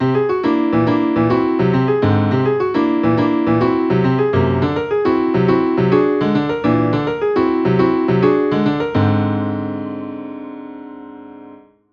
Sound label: Jazz Symmetrical Scales; How To Improvise With Symmetrical Scales